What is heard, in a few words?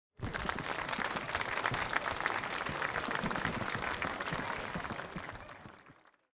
win; greedy